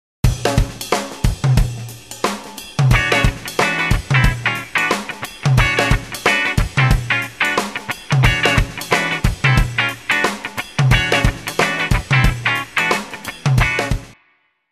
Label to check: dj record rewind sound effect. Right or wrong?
Wrong